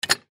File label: Go Kart Brake Pedal Release Electric; Go-Carts; Sports; Blastwave